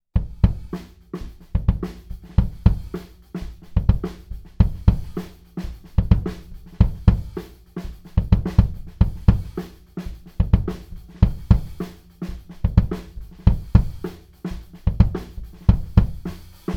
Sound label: KICK FET